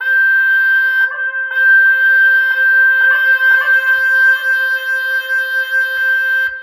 Zurna

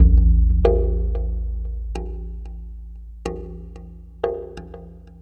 Tribal Wave Drum

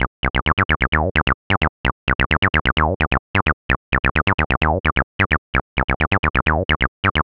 130 Cm bass, bass loops, trance

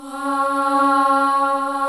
Boy Choir C4

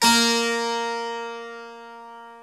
Harpsichord; E-MU Producer Series Vol. 2; More Studio Essentials; Keyboards

